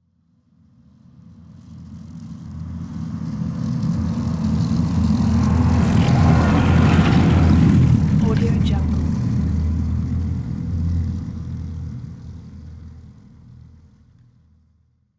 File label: snowcat passing by